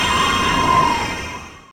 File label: spell Deep Freeze